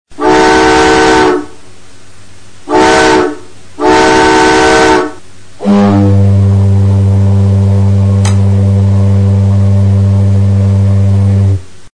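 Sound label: steamship signal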